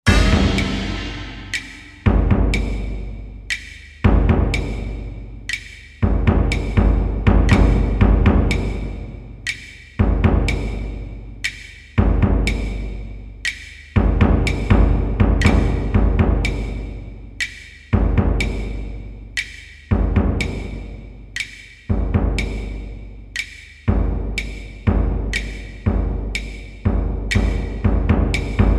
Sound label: Geisha Percussion; Percussive